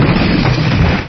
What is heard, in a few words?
car hits obj